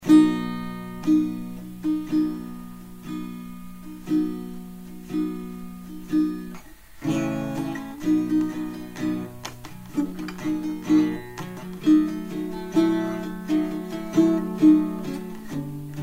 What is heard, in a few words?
blue guitar; house; placerville